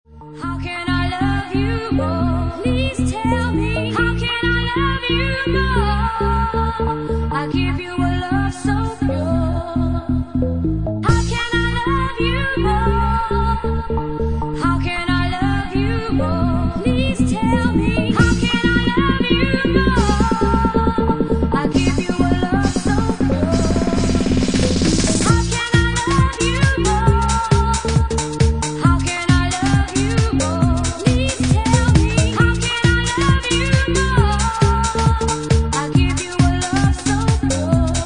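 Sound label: Organ Mix, Bassline House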